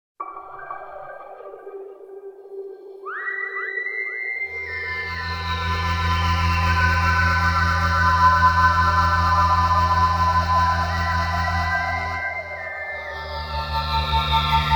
Sci Fi; Soundzabound Volume 3 Disc 2

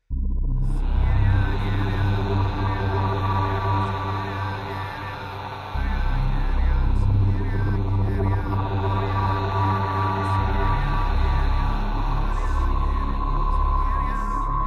kecak

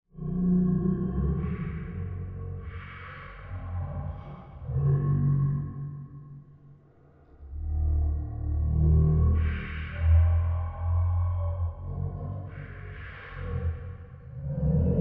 growls in cave

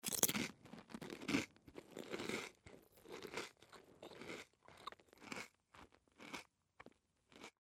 Chew Crunch

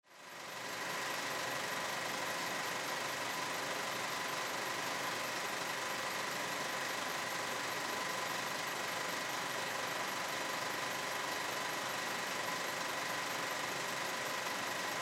movie projector

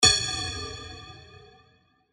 Impact

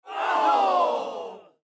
crowd ohh

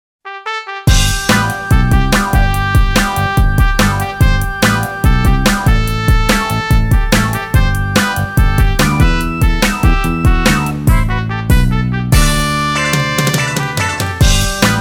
Cheezy 70's Game Show; Soundzabound Volume 3

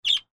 paraquet squawk

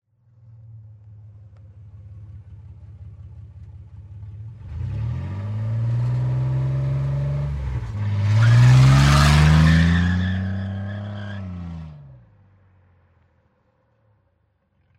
Car Drive Tire Squeal

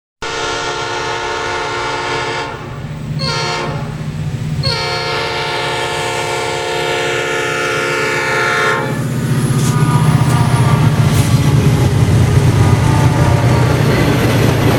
Train; Soundzabound Volume 3 Disc 3

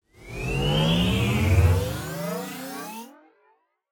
Ascend Digital

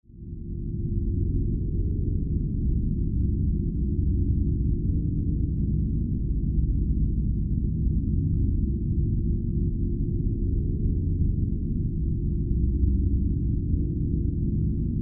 Drone Science Fiction

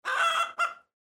chicken cluck

